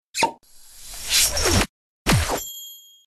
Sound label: reloading; pixel gun 3d; christmas ultimatum